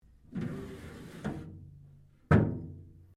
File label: DRAWER METAL CLOSE, Sounds onboard HMAS Castlemaine; Boats; Vehicles